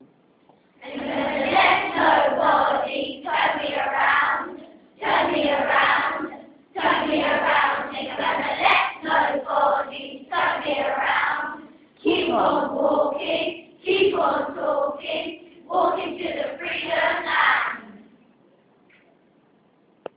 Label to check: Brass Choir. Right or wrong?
Wrong